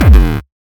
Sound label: Kick